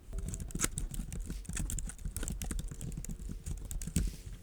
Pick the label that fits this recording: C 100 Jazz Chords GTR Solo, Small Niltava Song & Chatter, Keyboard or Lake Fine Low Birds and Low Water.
Keyboard